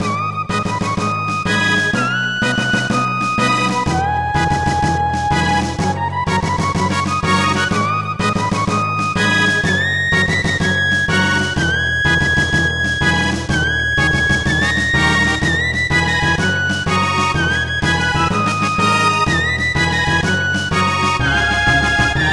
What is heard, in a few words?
Frogs Theme